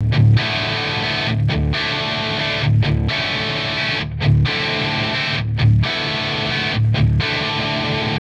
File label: GUITAR STOCK RIBBON 10K BOOST; Stock Wa73-EQ Distorted Guitar 10k boost; Revive Audio